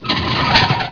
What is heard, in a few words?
Scrape